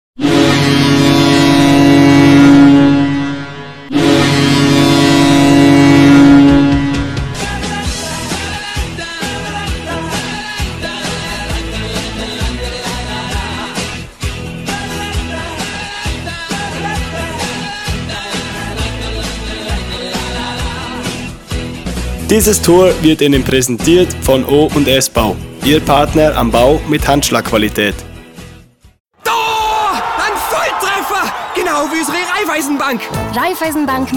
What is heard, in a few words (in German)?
Torhymne; FCA